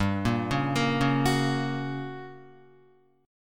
G Minor Major 7th Flat 5th G, Guitar, 4F, 4A, 5C, 5G, 5C, 6E; GmM7b5; arpeggiated; 2,1,1,3,1,2